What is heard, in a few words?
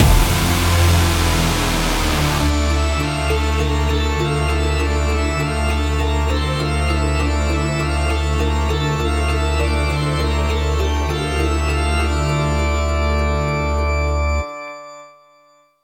Lose; Miraculous Rise of the Sphinx